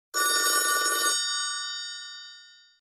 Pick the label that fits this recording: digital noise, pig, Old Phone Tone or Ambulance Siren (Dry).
Old Phone Tone